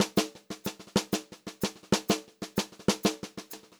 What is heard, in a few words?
126 CL VARI L, Pop-Rock Drumloops; USB Soundscan vol.37; AKAI; 90 s Sample CDs